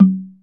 Tongue Lo; EMU Planet-Earth

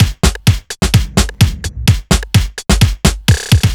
Fill, Drum Loops 128bpm; Zero-G; Soundclash Nu Dance Electro; Acidized Wavs; Jock n Roll

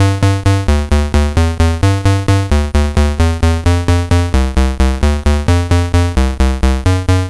Game Boy Bass; Nintendo Game Boy

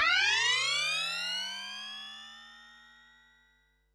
Perc, Percussion and FX; Pearl SC-40

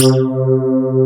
wet bass

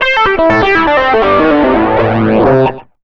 SOLO L, Electric & Acoustic Guitar Loops; USB Soundscan vol.04; AKAI; 120 SOLOS

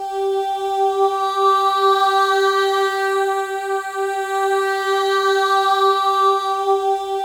MOVING V4, Symphony of Voices; Spectrasonics

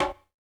Congas; African Contemporary; Spectrasonics; Supreme Beats

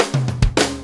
4-4 Fill, Drum Loops

